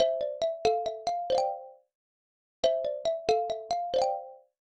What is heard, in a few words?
Phone Arrive; d4dj groovy mix; android